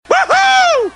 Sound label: "woohoo"